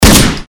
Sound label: Deagle